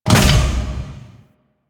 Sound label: Corpus Powerplant Jettison Door Stop; Ambience; Warframe; Corpus Railjack; Tether Power Plant